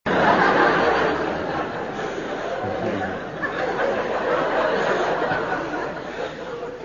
vocale rires ampli

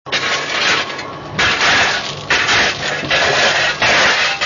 texture bruit de charbon

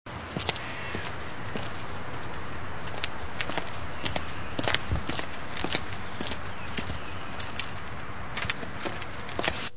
pas

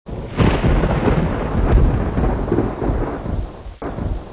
texture eclair

